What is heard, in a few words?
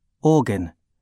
organ